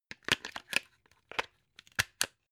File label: plug plug in clatter long